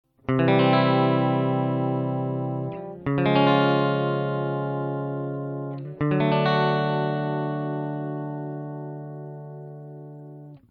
c shape barre

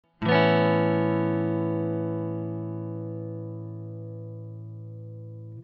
arp

